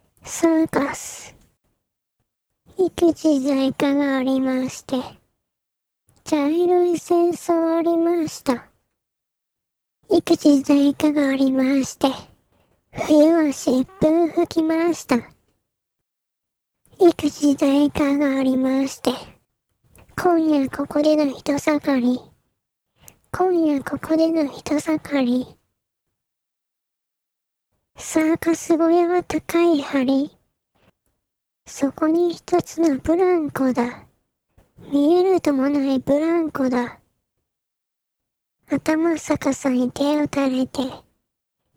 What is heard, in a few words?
circus